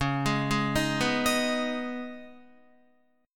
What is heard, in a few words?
C sharp 7th Suspended 2nd, Guitar; arpeggiated